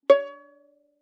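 Violin pizzicato fortissimo on A string Db5 stereo; MIS Pitches - 2014; Strings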